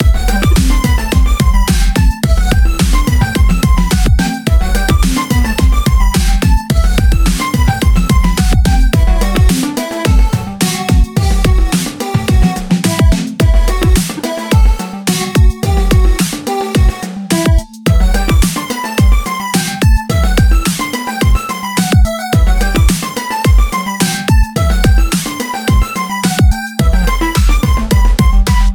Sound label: Deep Shame (Instrumental); Friday Night Funkin Shingles Showdown windows gamerip 2022